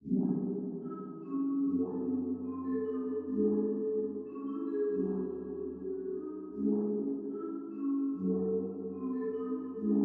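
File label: 148bpm Keys Melodic C minor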